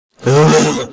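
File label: silly male laugh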